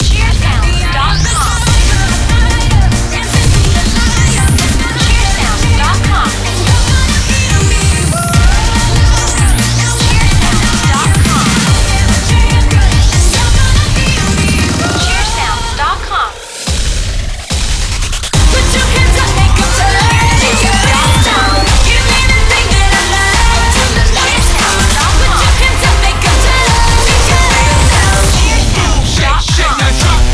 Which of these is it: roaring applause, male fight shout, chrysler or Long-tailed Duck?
roaring applause